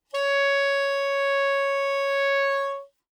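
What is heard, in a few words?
Soprano Saxophone non vibrato ff Db4; Woodwinds; Bb Soprano Saxophone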